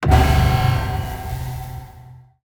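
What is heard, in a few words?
emergency sea alarm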